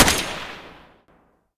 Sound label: nailgun fire; weapons